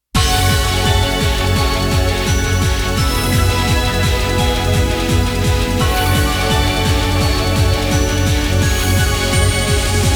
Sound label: Battle Everlasting loop; otome the exorcist